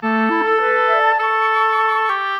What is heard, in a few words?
Winds, Orchestral, Rock Pop